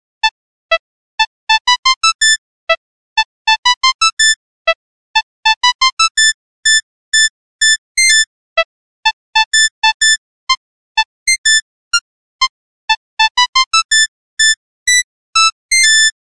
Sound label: Ring Tone (Mono); Smeshariki: Kaleidoscope of Games